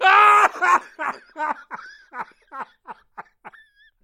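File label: zarazitelnij smeh, infectious laughter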